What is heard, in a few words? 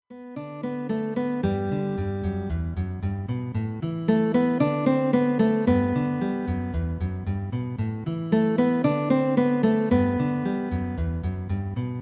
synth